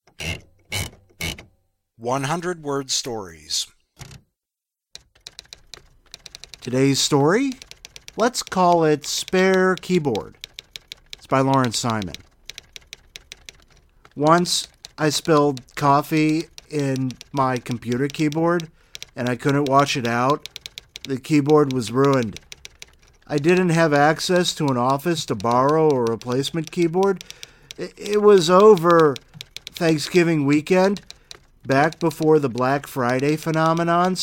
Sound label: spare keyboard